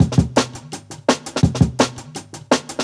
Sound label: 168, Jungle Breaks; Original Jungle Breaks